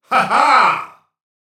Warrior Grunt Physical; Realm Royale

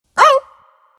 pain; lycan

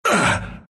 grunts, ru

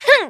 Basic Attack; Battlerite; Alchemist

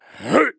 Grunt Jump

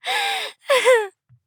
Mage Laugh; Realm Royale; Technomancer

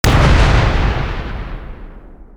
Remote Mines explode

